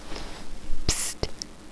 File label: PSSST